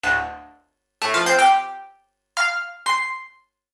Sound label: Sony DRE 2000 Modal Dry